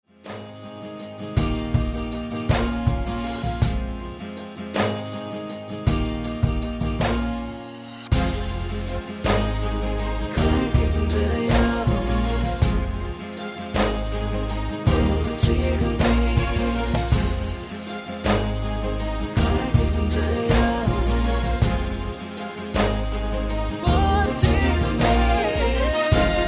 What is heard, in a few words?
Karaoke